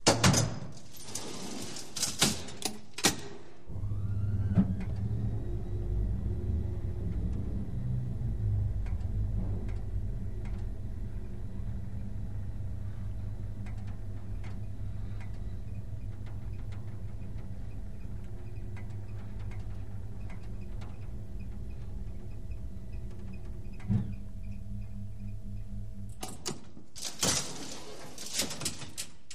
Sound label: Elevator Doors Open, elevators